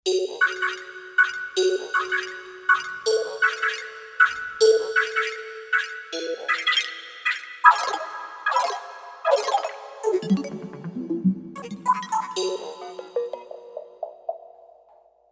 Gruppen, Kawai K5000S factory presets

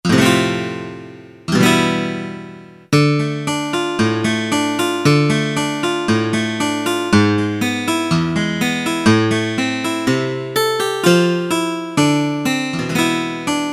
A. Guitar, Roland U-220; Factory Patches

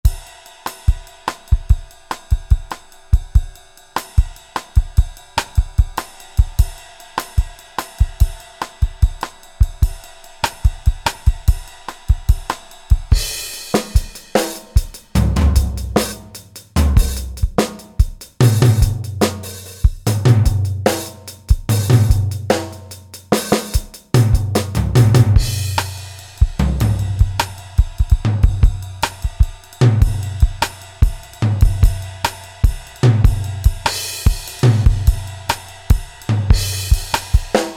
Latin KIT, Roland SRX-01 Dynamic Drum Kits

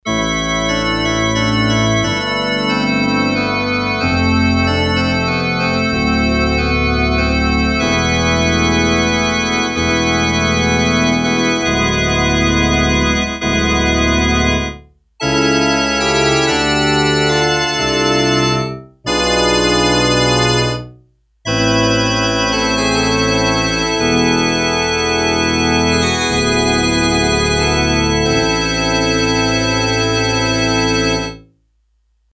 Pipe Organ, Korg DS-8; Factory Patches

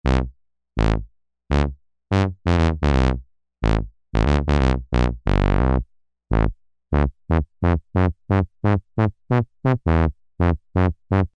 tuba, Korg M-500 micro-preset

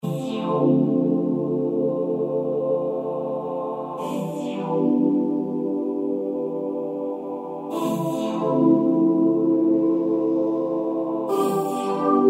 choir, Kurzweil K2000; effects; Kurzweil K2000 Value Pack; Xtra Programs; Jord Vox

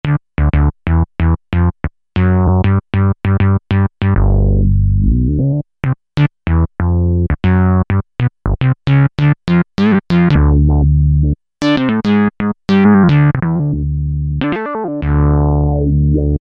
Latex Synth; Factory Patches

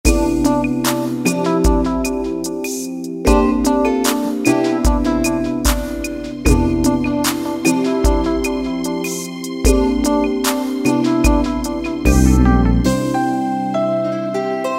Pop, Roland EM-10

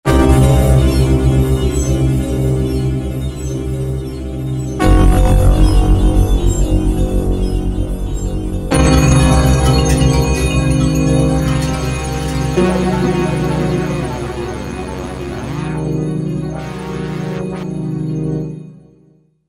Pad, Roland V-Synth; Factory Patches; Junker Pad

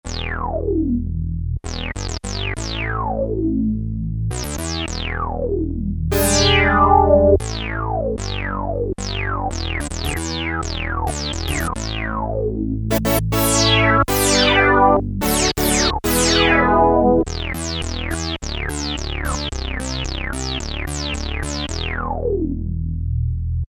Synthe, Korg PolySix; Factory Presets Bank B

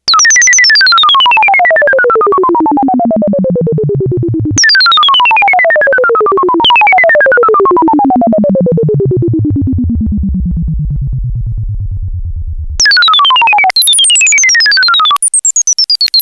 SPACE SOUND, Roland SH-101; Factory Patches

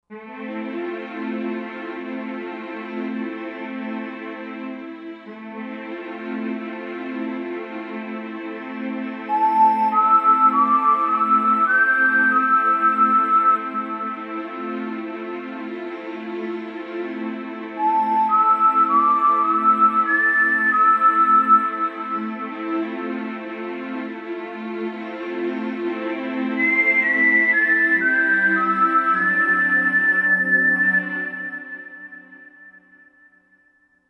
Whistle, Roland JV-2080

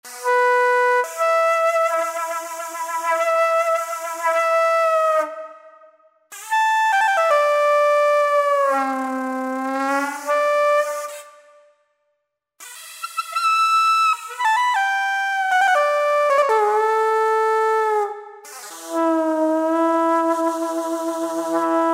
Shakuhachi; Factory presets

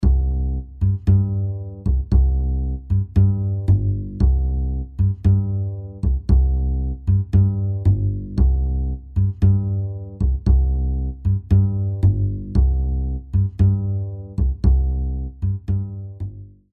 Woody Latin Bass; Apple GarageBand 2; Apple Loops

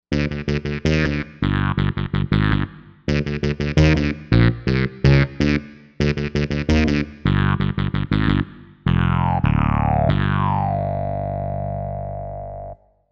KillerBass, Korg M1Rex; Factory Patches

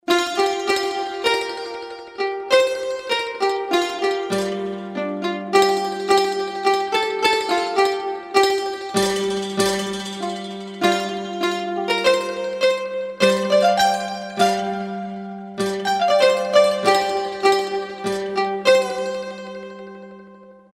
Chung Gang; Roland SR-JV80-14 World Collection Asia

